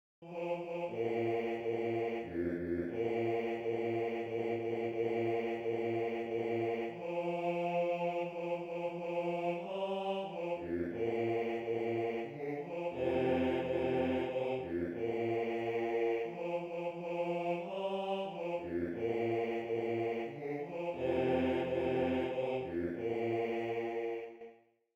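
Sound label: male choir, Bass II; Kemmts leie einer in die Stubn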